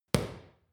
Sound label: boxing paw hit hard